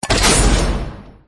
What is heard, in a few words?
Click; the great ottomans strategy battle for throne android